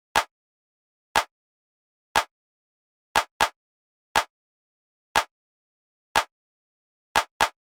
Clap, Dancefloor Pop; Remixlive

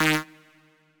Lead Thump Hit, Dancefloor Pop; Remixlive

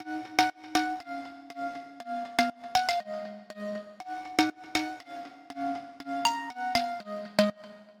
Chords Glittering; Remixlive; Dancefloor Pop

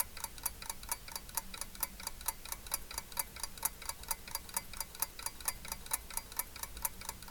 clock tick tock; SOUNDS RAW

